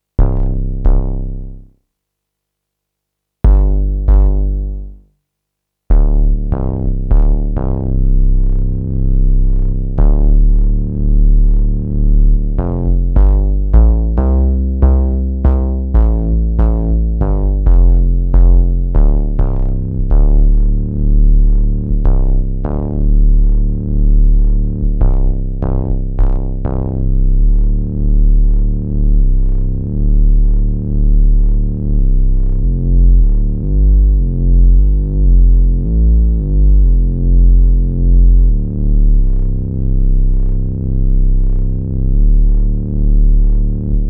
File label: Suspense Bass